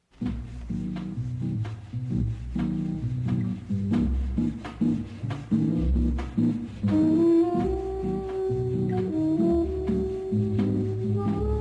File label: Bossa Nova